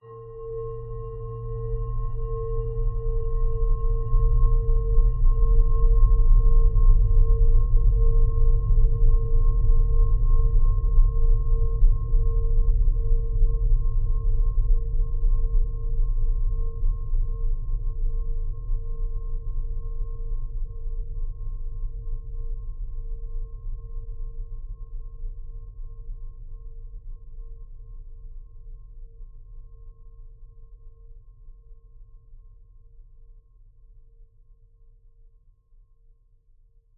horror drone dark desolate eerie low pitched bell resonation behind dark droning